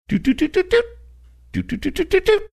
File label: making sneaking around sounds